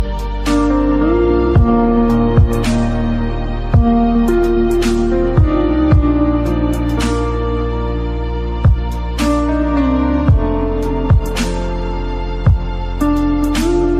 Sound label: sad funny music ringtone; funny ringtones